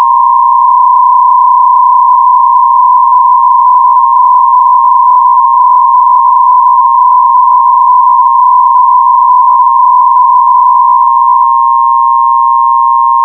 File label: psk125R, modes